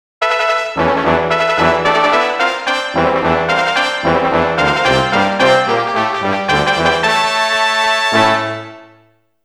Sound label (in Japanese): かなりヤル気のファンファーレ; Monster Farm 1 2